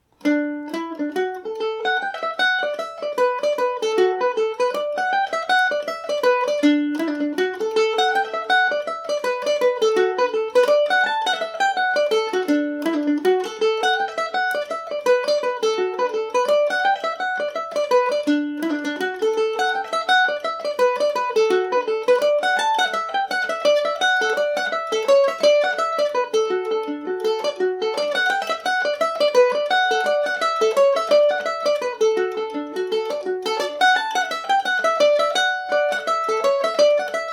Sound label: dmaj no metronome, mandolin